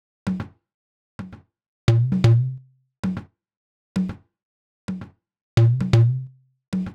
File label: Cn-Fx Loop 130, Perc Loops 130, Loungin House; Equipped Music